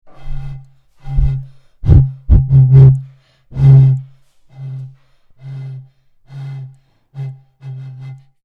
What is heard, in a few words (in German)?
Flasche pusten